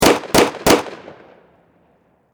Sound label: Pistol 45 Springfield Triple Shot Close Perspective; Warfare; Pistols